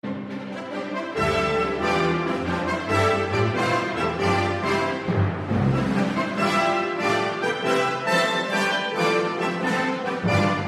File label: Folk National